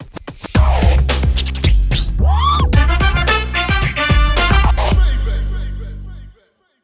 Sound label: Victory; PlayStation All-Stars Battle Royale; PaRappa A (Gold Record Scratch)